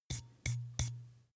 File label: glass bottle handstroke sequence fast